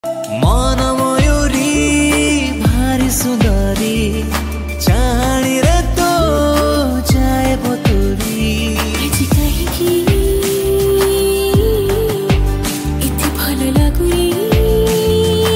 Odia Romantic Love

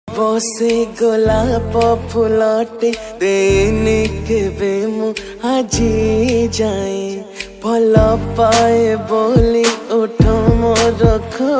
New Odia Romantic

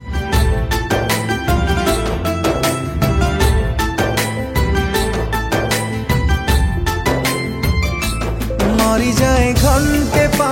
Odia New Romantic Song

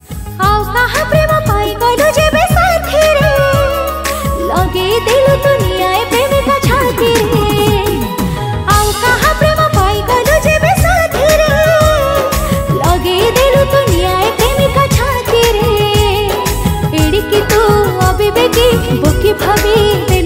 Odia New Video Song

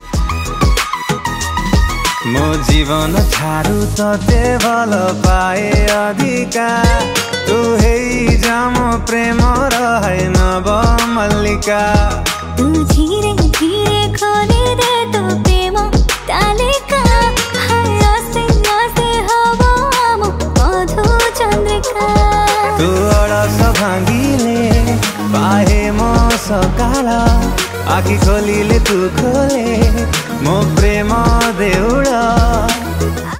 Song

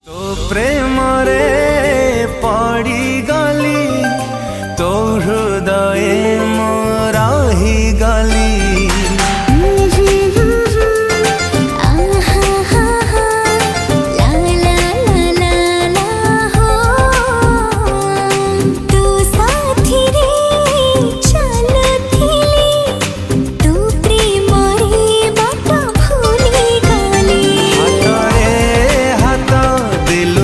New Odia Romantic Song

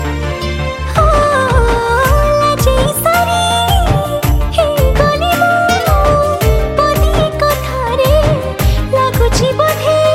Odia Romantic Song

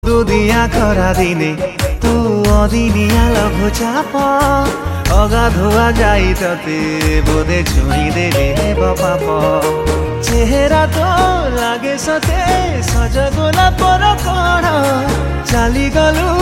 Odia Song

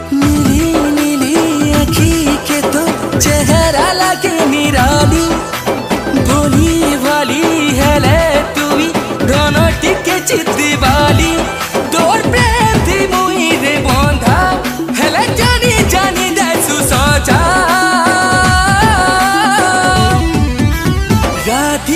New Sambalpuri Song; Odia

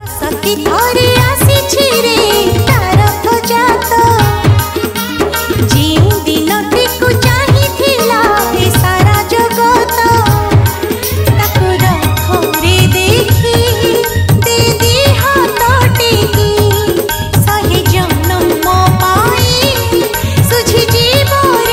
New Odia Bhajan

